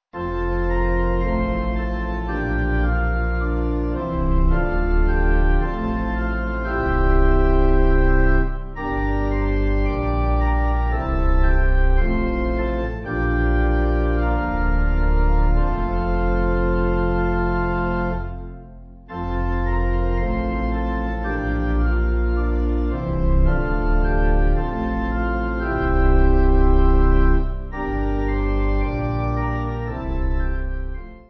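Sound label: Pipe, Pipe Organ backing; 8.5.8.3; 6/F; Holy Father; Westridge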